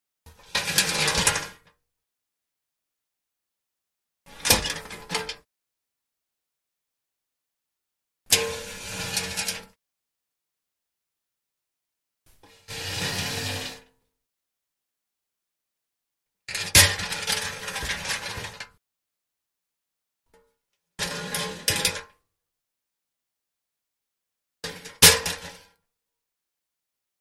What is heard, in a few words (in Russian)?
zvuki peredvizheniya stula